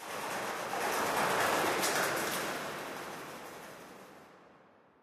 amb wing flap, birds; source engine; portal2

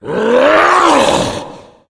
tank throw; yell; Left 4 Dead; Source Engine

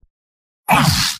pain sharp; spy; video games; source engine; tf; mvm

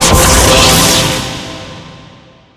spell overheal; halloween; video games; source engine; tf

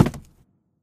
phys cube, physics

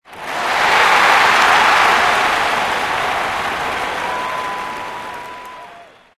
bumper car cheer; ambient; Source Engine; tf

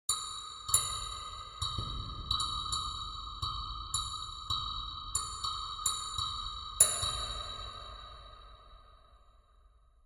ambient synth grain click

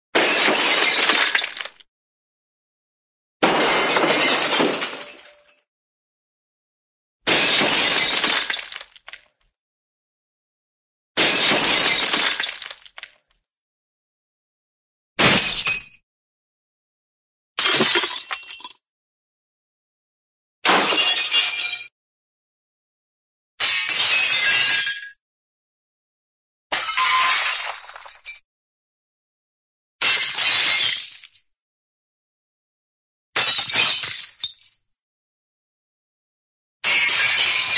smash, dishes dishes crash, short; cartoon

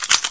9mm clip; Source Engine; bshift

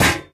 physics turret gibs

